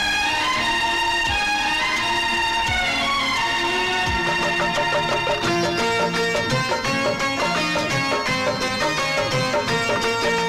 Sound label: Instrumental Tone